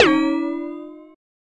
Bend Up; FREE SFX PACK VOL 3

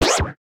weird, sfx

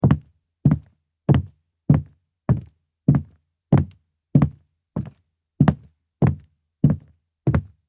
Wind Footsteps; horror; horror sfx vol 1

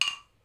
wood rattle; abstract; Free Sound Assets

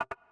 Attack; Attacks

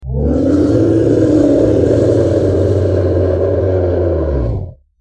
Monster Growl; horror; horror sfx vol 1

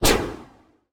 retro explosion; retro synth SFX

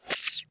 monster; CC0 creature sfx 2

